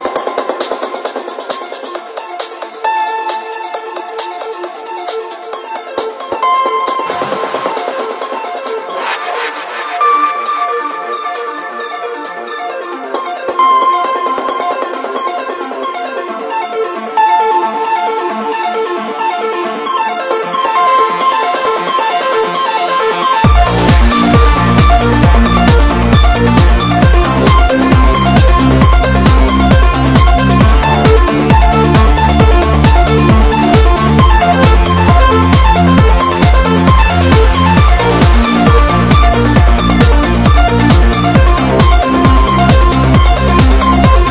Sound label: dub instrumental, disco